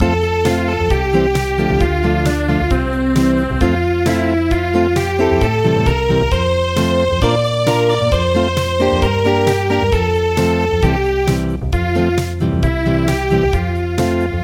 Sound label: Acoustic